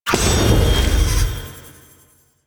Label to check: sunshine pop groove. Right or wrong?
Wrong